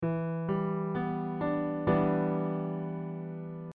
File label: Em7 Chord